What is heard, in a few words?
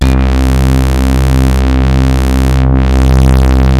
MOOG, ROLAND D - 70; Rubber Chicken Software Sample Bank